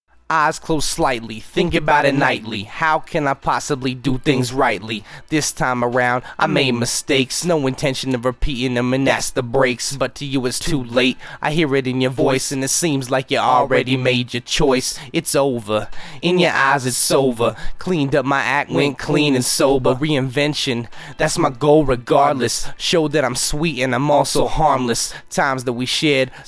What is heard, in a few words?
acapella